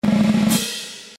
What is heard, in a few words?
hehe